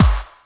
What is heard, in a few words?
KIT, Dance Mega Drums; Best Service